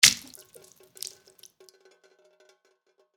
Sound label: Blood or gore splatter floor surface